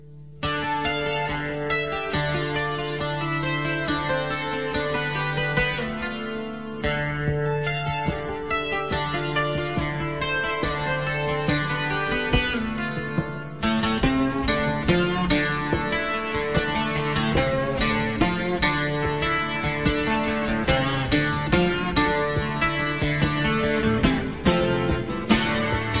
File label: acoustic intro